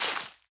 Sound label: Leaf litter break; Ściółka